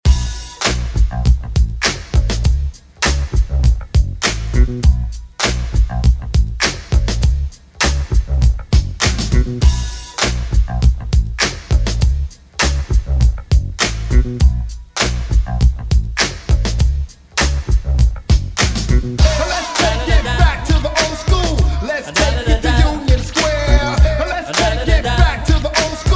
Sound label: DJ Intro Outro Hype Edit 100 Bpm